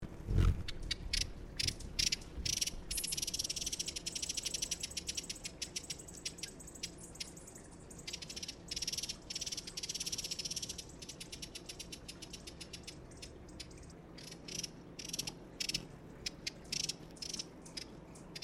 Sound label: broad billed hummingbird